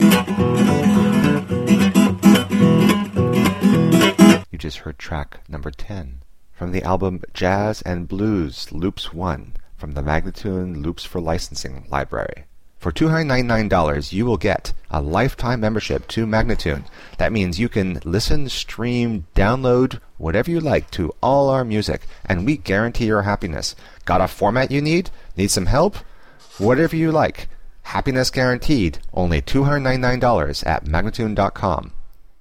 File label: Jazz and Blues Loops; MagnaLoops